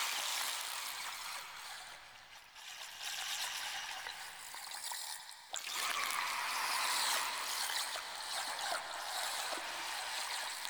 Lair Chatter Loop Large; Warframe; Ambience; Sentient

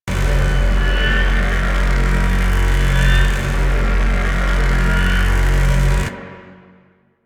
Mega Beam Loop; Warframe; Wisp

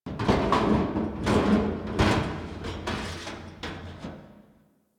Destruction Metal Scrapes; Ambience; Warframe; Corpus Railjack

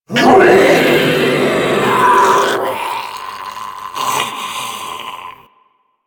Infested Jetpack Jump Attack; Warframe

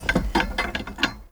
Smithy Metal Misc; Soul Plane

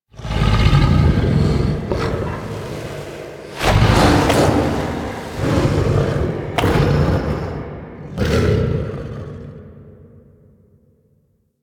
Idle Noble Pistol; Warframe; Voruna; Idles Noble

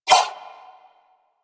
Nunchaku Whoosh; Weapons; Warframe; Tenno

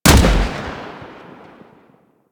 Spark Rifle Shot; Grineer

